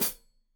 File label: Hat Foot, Hi-Hat, Cymbals Stereo